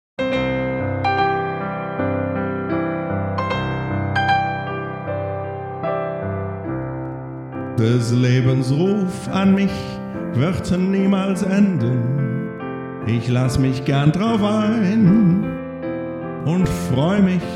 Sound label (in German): Ballade